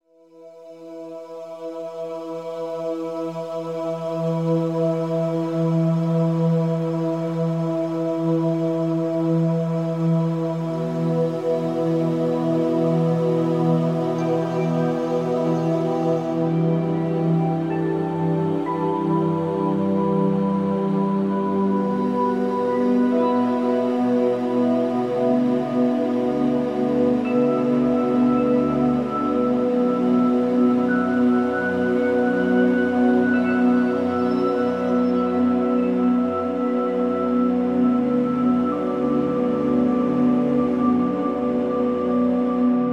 sauna and massage academy relaxation music cricket sound